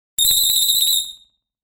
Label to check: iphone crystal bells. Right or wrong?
Right